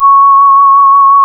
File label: C#, Synclav FM, WIND; Big Fish Audio